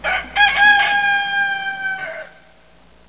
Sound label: ROOSTER